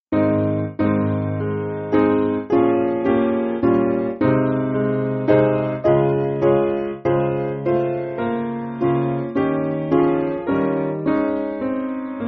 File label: Piano; Be Thou Supreme; Skene